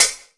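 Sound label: open hi-hat, Drum & Bass; Ueberschall; Drum & Bass Resonance; 155 BPM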